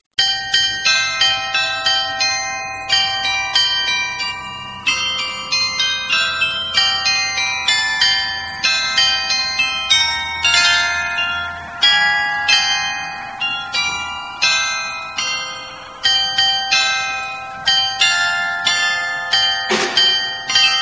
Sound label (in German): glockens